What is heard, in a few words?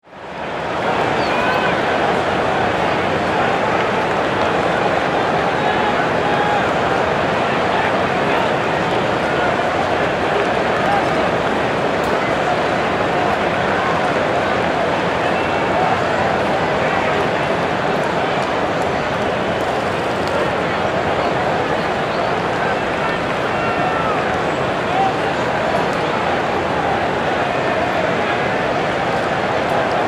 Sports Crowd, Sports, Crowd, Ambience, Walla; 5.1; Sound Effects:Science Fiction; BlastWave